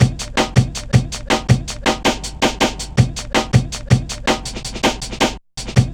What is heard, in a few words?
helicopta, drum loops; Zero-G Jungle Warfare 1, 2 & 3